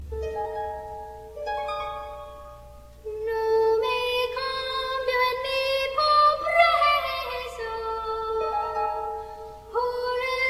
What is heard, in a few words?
quarter tone harp